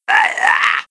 Attack; Playable Tutorial Level

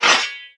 Trap Spikeball Spokes; Playable Tutorial Level

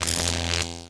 Electric One Shot; Playable Tutorial Level

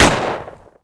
Security Guard Fire; Playable Tutorial Level; TR3

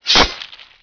Warrior Sword Slice; Playable Tutorial Level; TR2

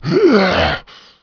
Henchman Jump; Playable Tutorial Level; TR2

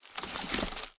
Basket Open; Exhibit; Playable Tutorial Level

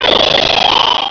Big Spider Death; Playable Tutorial Level; TR2

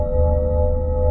Light Beam Loop; Playable Tutorial Level

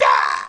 Blowpipe Native Death; Playable Tutorial Level; TR3

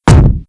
Feet; Sword God; Playable Tutorial Level

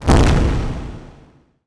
Fire Shoot; Willard; TR3; Playable Tutorial Level

